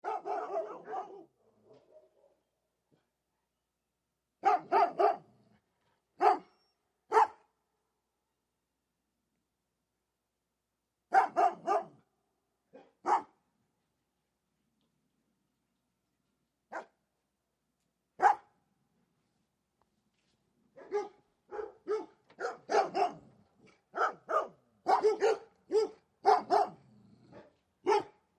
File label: Bernese Dogs Bark Out